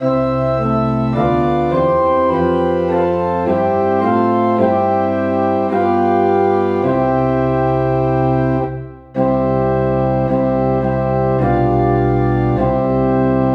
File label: Organ in C